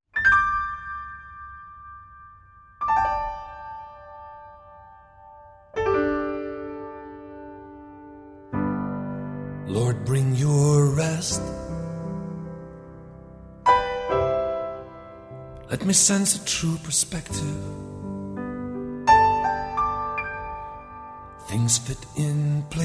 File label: Piano